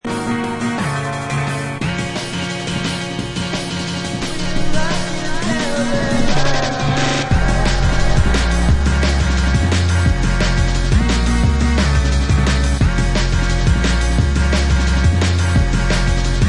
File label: Drum Bass